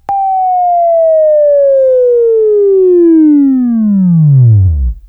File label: WAV Left, Sweeping; Sweeping Training program